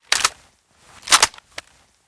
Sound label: smg1 reload; weapons